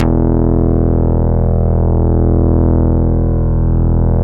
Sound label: ANA BS, SYNTH BASS